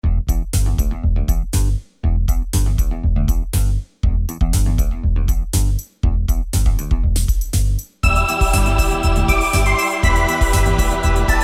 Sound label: Groove Bass; Music for Games II